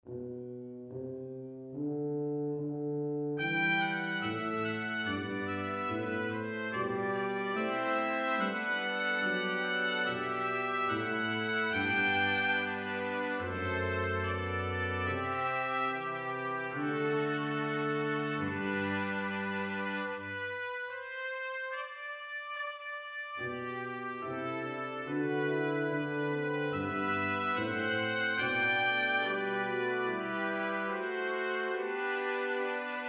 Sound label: Brass Quintet; koperkwintet; BWV 115 Straf mich nicht in deinem Zorn; Kwintetten